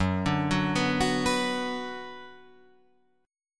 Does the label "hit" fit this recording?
No